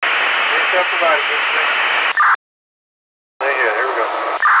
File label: 3 steps; rail